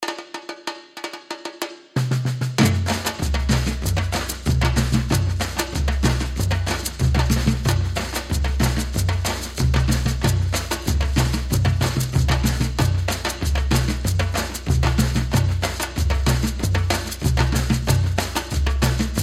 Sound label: batucada